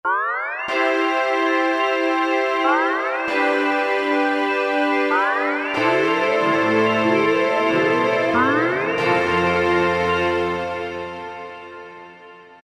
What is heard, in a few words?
String Rise, Korg M1; Factory preset examples